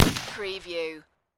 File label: Rifle Winchester 30-30 Single Shot Exterior At 45ft